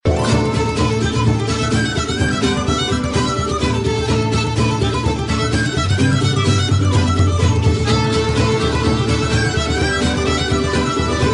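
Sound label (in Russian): ирландская этническая музыка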